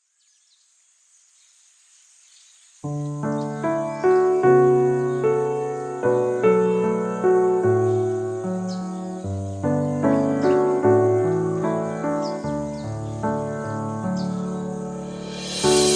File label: bg music